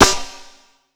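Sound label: snare drum, Drum & Bass, 160 BPM; Ueberschall; Drum & Bass Resonance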